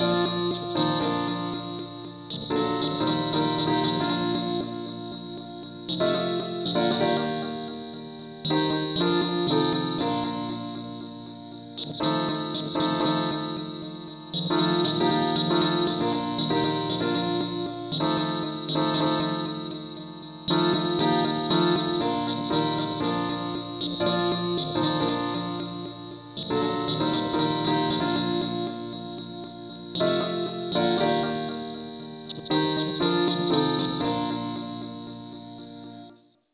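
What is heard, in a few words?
parts only, key b flat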